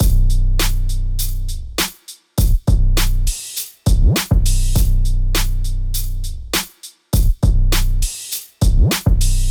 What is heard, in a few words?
old school inspired trap drums